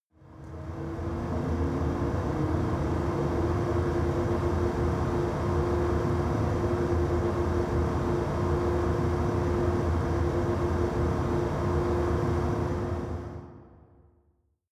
factory hall

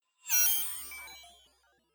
fly by drone digital

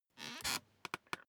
office chair creak sit up short

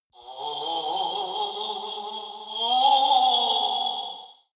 halloween toy vocal distortion

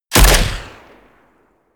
shot

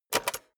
cassette player slide tray take out medium

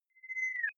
sci-fi lights on tonal

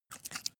rodent eat short

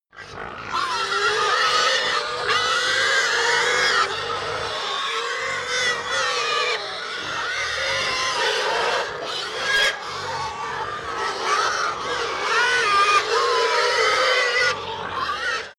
pig herd grunt scream many, pig

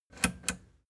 plastic button latch click enable slow

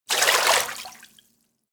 splash

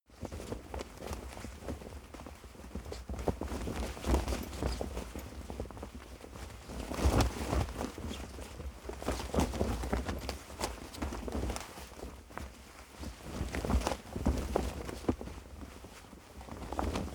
flag flap

